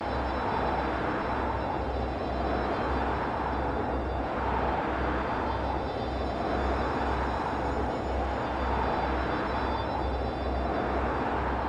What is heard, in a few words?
air crystal loop; Tower of Time; PS4